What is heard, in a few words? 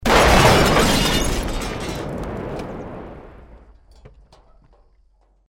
building collapse medium